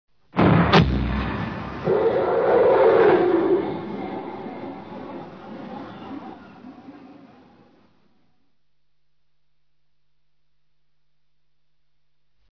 cartoon balloons comedy mighty balloon bursts

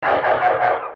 phone laugh

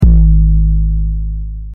real trap bass

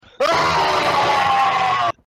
face smasher yell; FaceSmacher RAAAAAAAAARGH

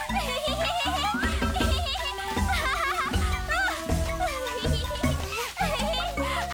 hihihihihihihihi ahaha

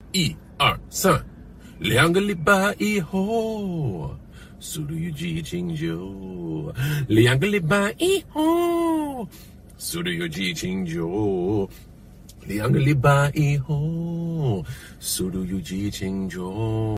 singing bing chilling; john cena

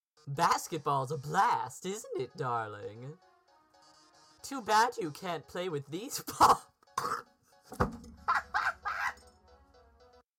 basketballs

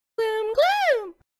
gloom notification

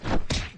terry punch; PUNCH – OldSpice Terry

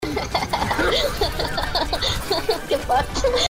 hehehehehe

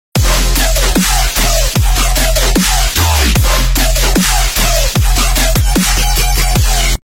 wobblestep, dubstep; how dubstep was discovered

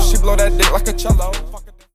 cello; Like a Cello

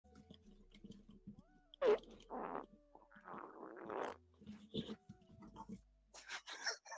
fart

